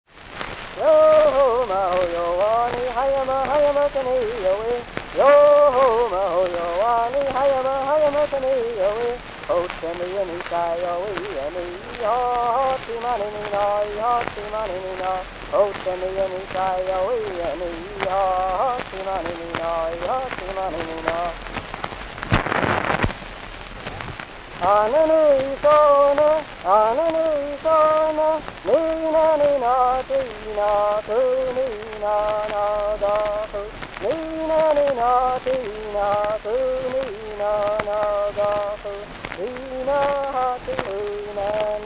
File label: Piute Gambling Song, Arapaho, Ghost Dance